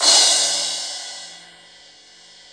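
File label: cymbal crash; Best Service Gigapack 2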